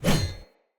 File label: Spear Whoosh; Weapons; Player